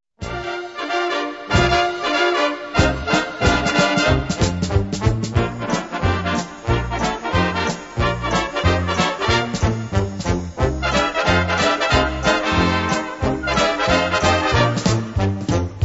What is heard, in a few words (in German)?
Tanzende Trompeten